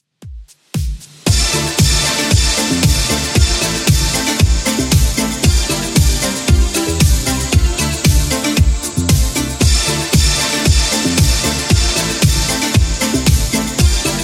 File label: 80s Redrum 115bpm